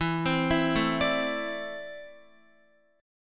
E 7th Suspended 4th Sharp 5th E down Guitar 4E 4A 5D 5G 5C 6F; arpeggiated